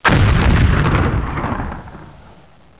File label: damage